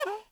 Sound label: squeak, machines